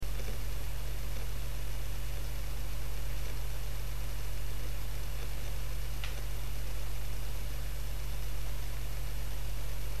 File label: seagate big, hd; hardware